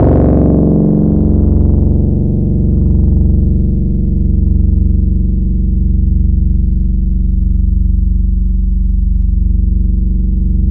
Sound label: MonophBass f#1, Yamaha YX81Z